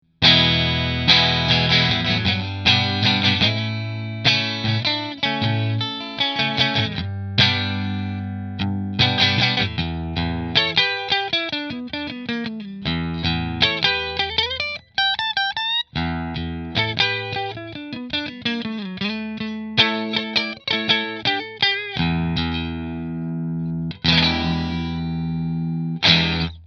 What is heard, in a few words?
Fender Strat Sunburst Position 3 Fender; Fender Stratocaster Sunburst 20th Century American Standard